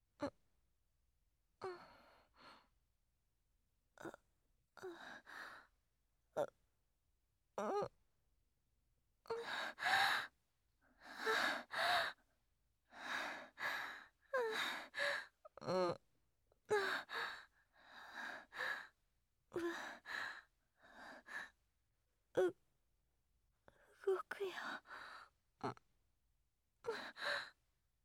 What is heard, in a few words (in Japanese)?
挿入 喘ぎ; SEなし